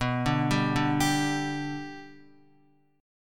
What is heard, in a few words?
B-Minor Sharp 5th, Guitar; arpeggiated; JGuitar